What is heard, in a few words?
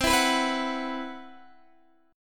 C Major Sharp 11th, Guitar DADGAD